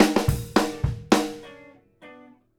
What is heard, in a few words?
FILL